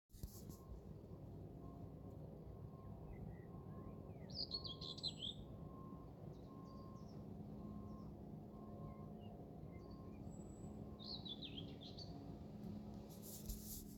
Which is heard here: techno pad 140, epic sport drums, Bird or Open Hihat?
Bird